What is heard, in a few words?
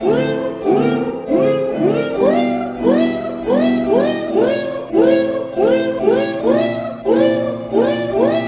piano; undyne; Gachitale